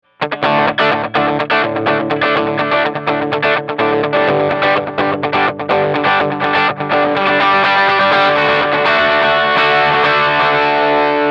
Vintage Dot 8th

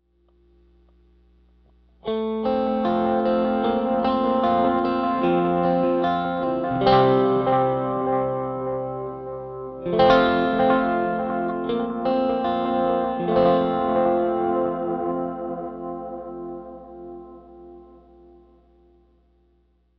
Panther SM57 Delay

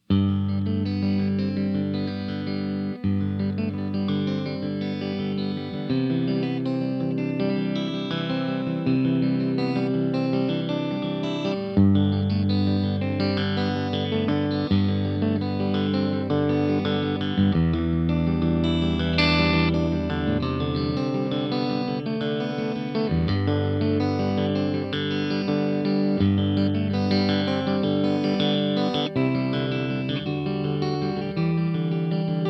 Tele Center Clean

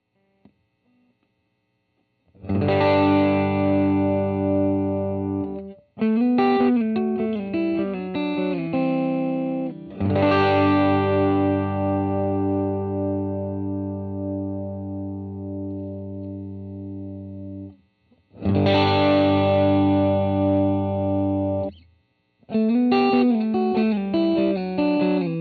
SM57 Clean

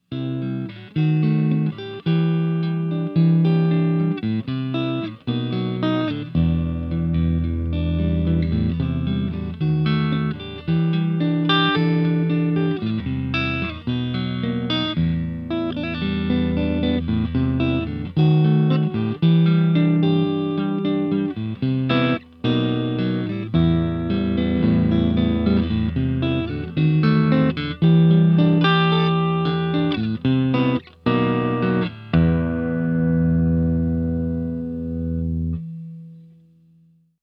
Tele Neck Clean

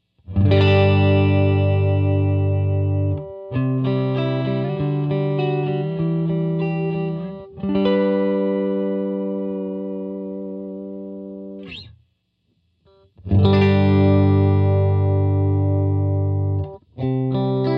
Starplayer TV Clean

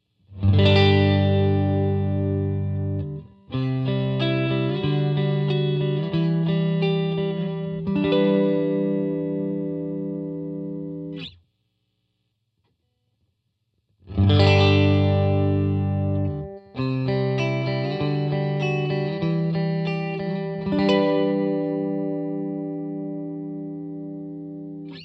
Clean Tele

